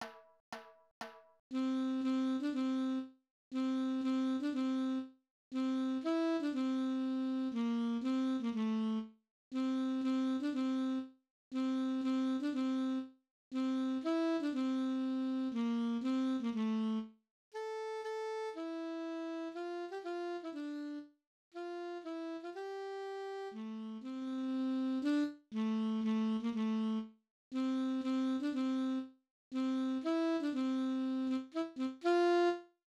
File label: sax